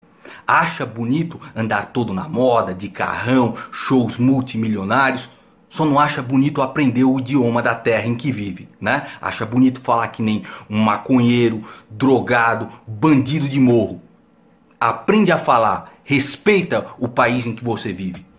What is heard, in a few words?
ringtone